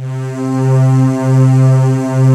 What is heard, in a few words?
KILLER, pads